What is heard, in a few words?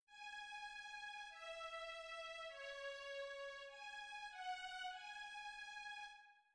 VIOLIN